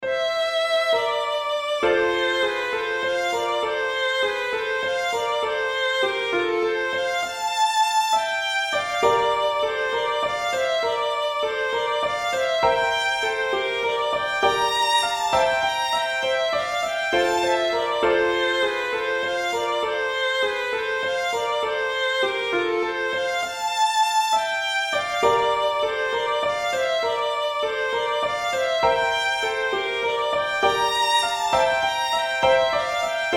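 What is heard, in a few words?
MELODY and THIRDS SLOW TEMPO; Partie de Quad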